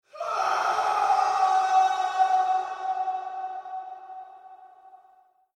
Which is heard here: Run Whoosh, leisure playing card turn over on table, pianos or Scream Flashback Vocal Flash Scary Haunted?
Scream Flashback Vocal Flash Scary Haunted